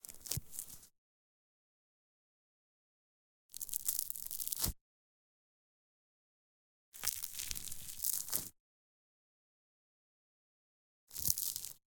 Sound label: rips and tears bread tear; sonniss gdc